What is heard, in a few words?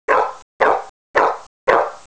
dog barks